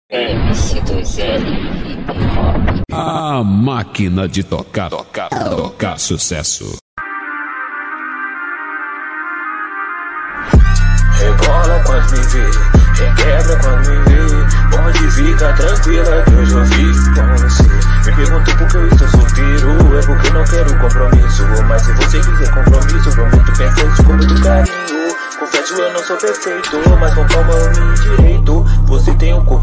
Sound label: hip hop